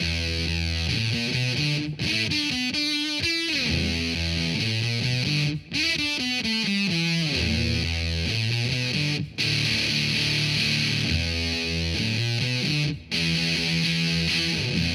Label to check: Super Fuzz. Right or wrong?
Right